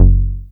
Softy Bass; Best Service Gigapack 2

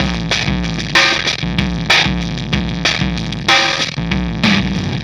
System Overload Beat, Processed Drumkits

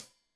Hat Tight, Hi-Hat, Cymbals; Deeply Sampled Drums

